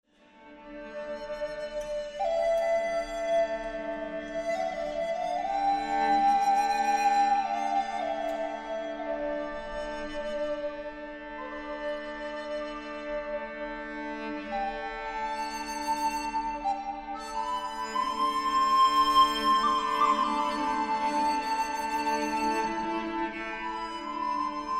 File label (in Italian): preludio flauto violoncello